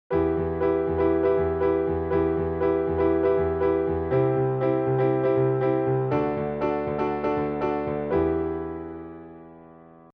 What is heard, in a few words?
sus2 chord progression em em c d